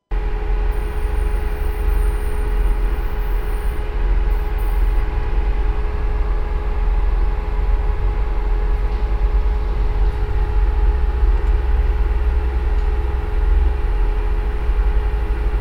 bahnschranke witterschlick

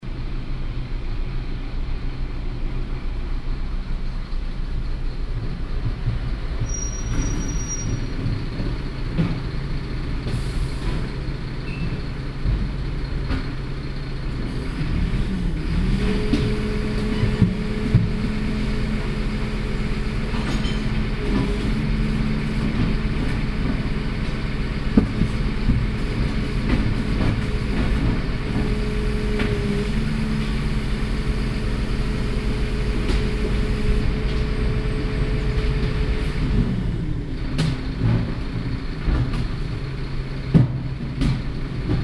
muellabfuhr

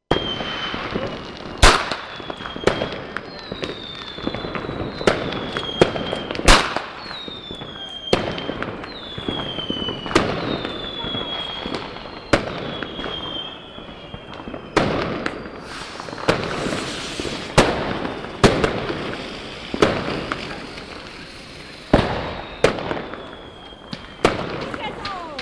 feuerwerk

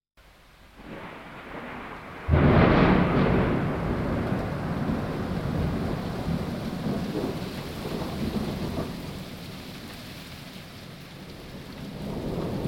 donner, natur

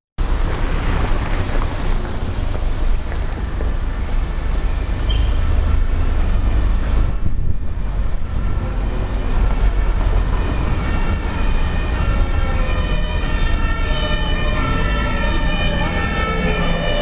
blaulicht

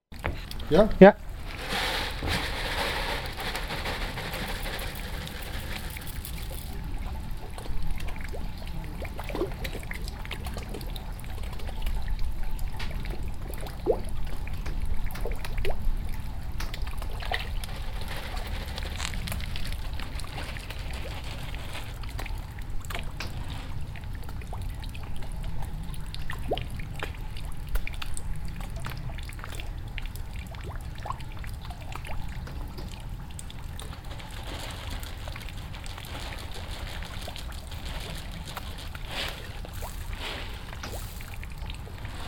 fisch, natur

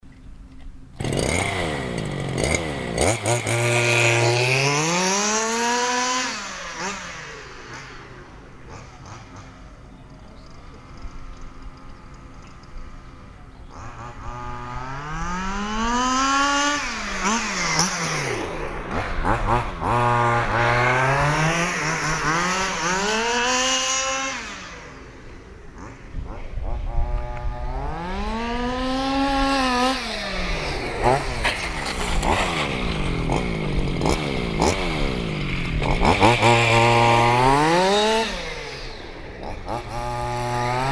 marder

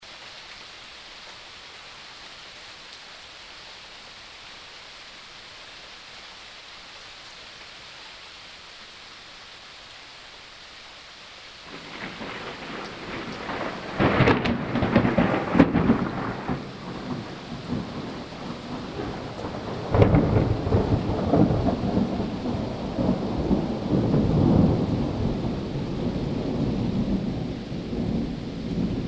donner, natur

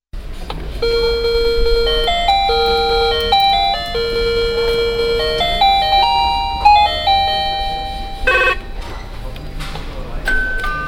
gongsysteme, menschen

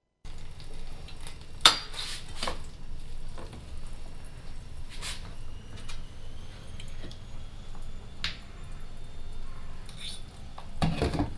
pfanne

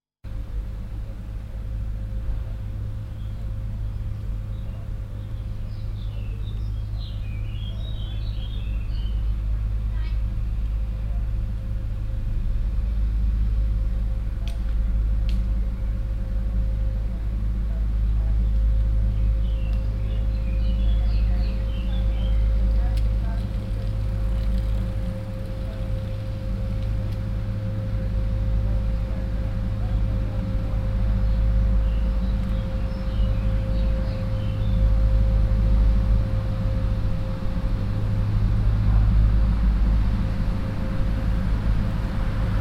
kehrmaschine

